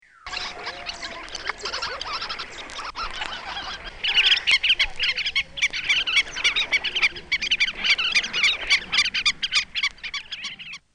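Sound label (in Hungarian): Feketeszárnyú székicsér; Madarak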